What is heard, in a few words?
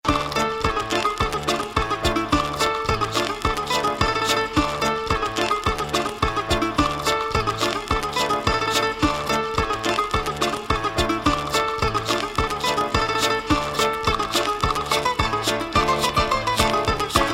balkan jiddisch